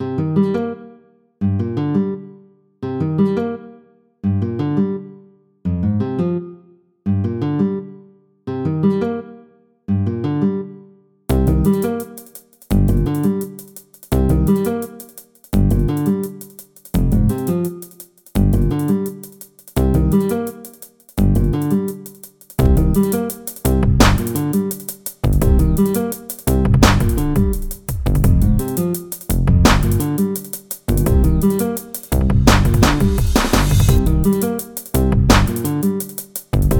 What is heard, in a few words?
hiphop